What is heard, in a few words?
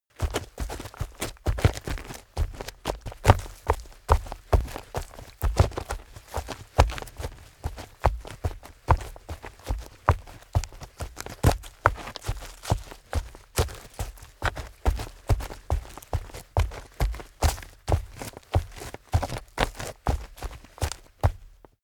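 step forest run, footsteps; schritte; menschen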